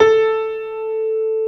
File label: A3, GRAND PIANO; InVision Interactive; Lightware VOL-1; Instruments & Percussions